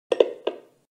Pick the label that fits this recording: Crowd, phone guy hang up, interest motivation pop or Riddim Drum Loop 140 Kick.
phone guy hang up